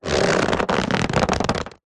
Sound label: cartoon leather rubber stretch